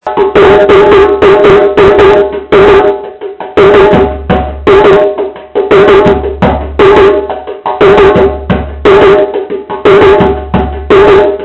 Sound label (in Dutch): Kadan Ritme